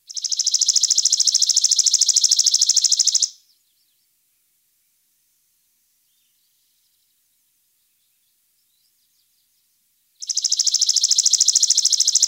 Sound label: Bird Songs Chipping Sparrow